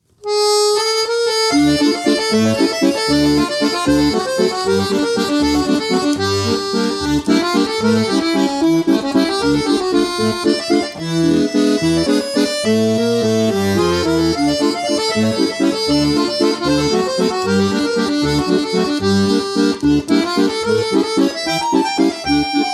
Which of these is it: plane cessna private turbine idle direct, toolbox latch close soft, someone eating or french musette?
french musette